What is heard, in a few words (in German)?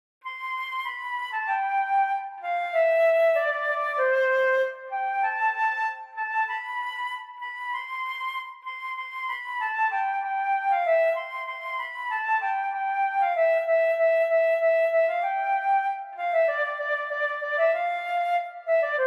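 Joy to the world Querflöte solo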